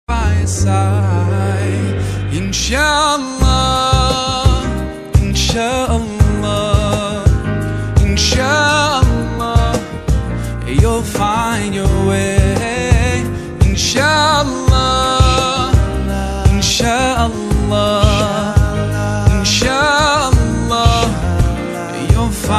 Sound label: arabic ringtone